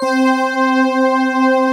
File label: SYNCHR BRASS; AKAI S-Series CD-ROM Sound Library VOL-2